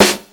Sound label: 90s present snare drum a key; snare-drum-samples